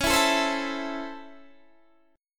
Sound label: C sharp Minor Major 7th Sharp 5th C sharp Guitar Standard; C#mM7#5 strummed; 21,19,19,17,17,17; JGuitar